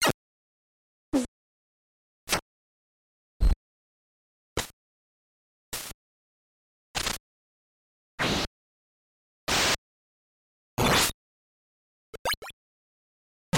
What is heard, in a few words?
sound effects; игровые; castlevania 3 draculas curse